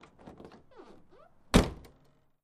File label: Citroen Deux Chevaux, Door Open and Close. Medium Cu.